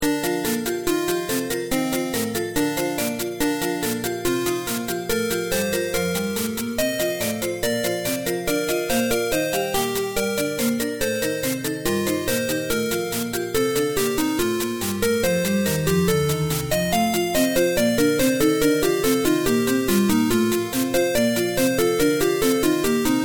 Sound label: 8bit Game Theme